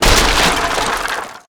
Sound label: objects, World of Tanks